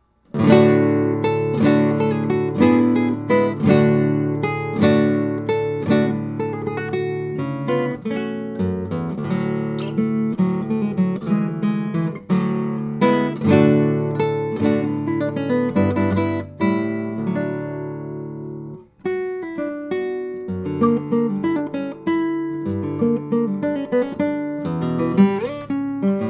velazquez concert, classical guitar, nylon string guitars; don manuel shop guitar